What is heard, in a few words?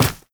step run, footsteps, player, mud